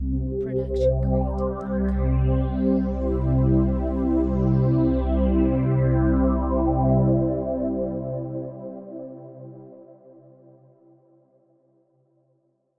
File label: Science Synth Pad Ident; SoundsCrate